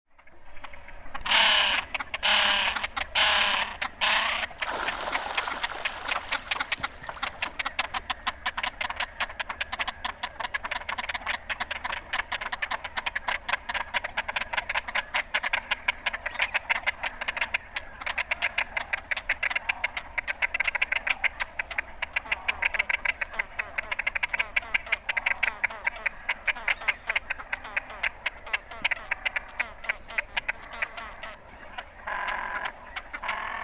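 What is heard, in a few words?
great crested grebe; Varsin äänekäs, mutta ei kaunisääninen. Äänivalikoima koostuu monenlaisista karheista korinoista; silkkiuikku; Monenlaiset vesistöt, runsain rehevissä lahdissa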